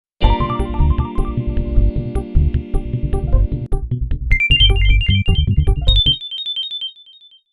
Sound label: electro jingle